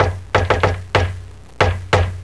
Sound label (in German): klopfen; geraeusche menschl